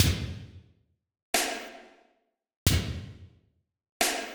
snare reverb tail; 军鼓混响尾音; Waves

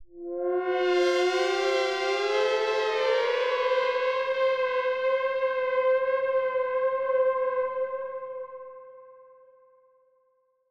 Pitch Fader, FX

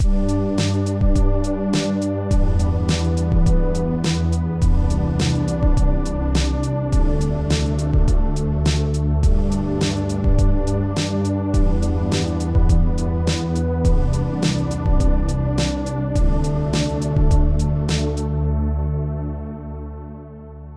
original drums; 原始鼓; Waves

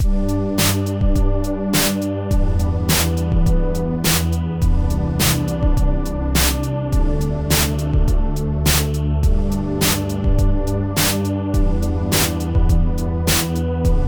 gated drums; 带有门限白噪音的鼓; Waves

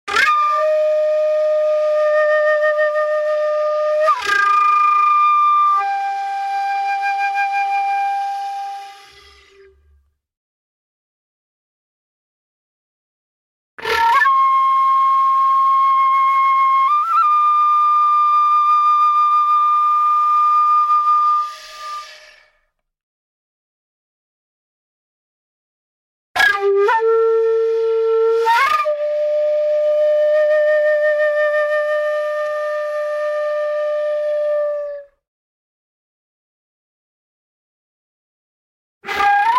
Bamboo Flute Phrase Flutter Tongue Long; C1; 竹林深处、湖水清风、空旷宁静、天地一色

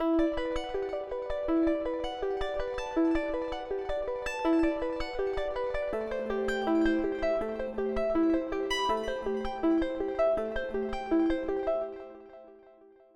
Piano in the Sky; Absynth 6

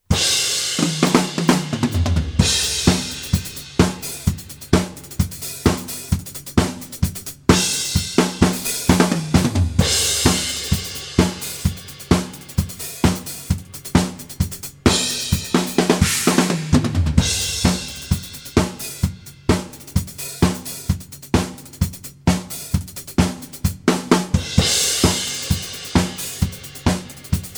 XY 90 Cardioid Overheads

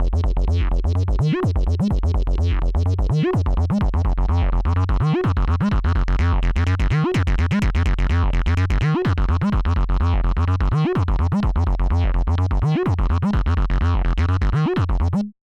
DNB Round Up, Bass; Absynth 6

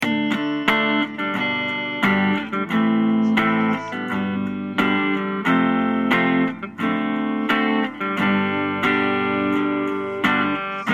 guitar di; 吉他DI; Waves 串联压缩

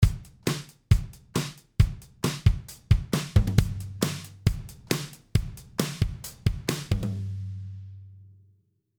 CLA-76 and CLA-2A; 前两小节未经处理，第三和第四小节则是CLA-76和CLA-2A的串联压缩结果; 鼓组; Waves

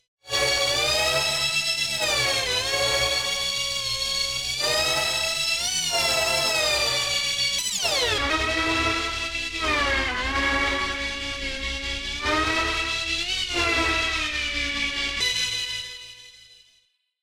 Drifter, Lead

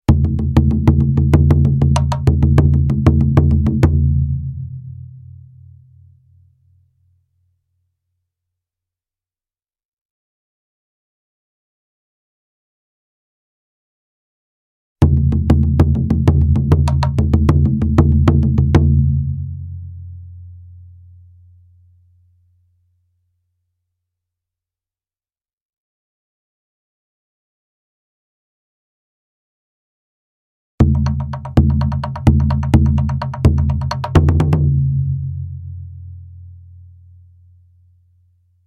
TangGu Rhythms Combo Hard Mallets Long Hvy; 木槌

